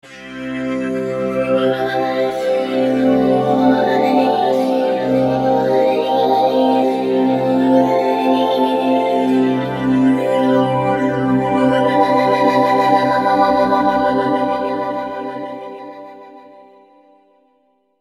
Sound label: Frozen Space; V-Synth